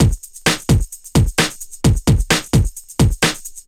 drt 130 electro, DRUMLOOPS, LOOP ELECTRO

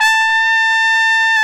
BRS AKG TP, Tp 6 AKG Jaz; Roland L-CDX-03

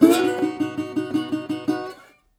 120, Blues Guitar; ILIO - Fretworks

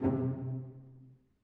cello piz C3, Strings; BBC

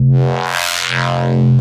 Reese Bass F; Antidote Advent

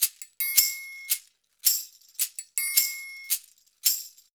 110 MIX, Percussion Loops

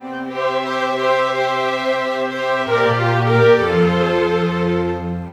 Strings; Sonic Foundry (Sony Creative Software); Orchestral Series VOL-4; Rock and Pop; Rock-Pop 12

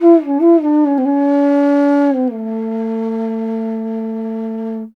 INDIA BASS, IND BASS

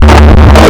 FX GTR, GUITARS KIT; Big Beat Samples & More; AKAI

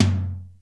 Low tom; Zoom RT-234; Kit B

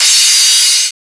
SUPER CRASH, PHUZZ DRUMS; Spectrasonic Distorted Reality 1

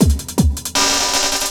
LOOP, 160 BPM, Drum & Bass

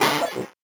VocoBit Noise, 8-bit; musicradar; 8-bit bonanza

